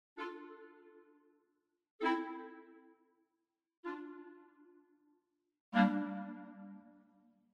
Traditional Clarinets Staccato; VENTO

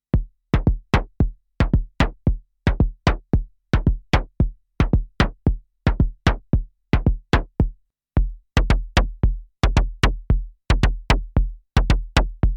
DFAM vs Edge Kick Snare

